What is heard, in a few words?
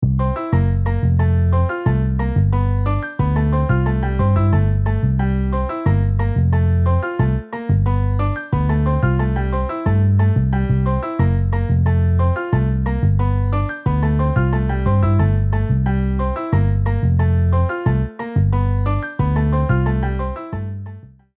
Piano Bass MIDI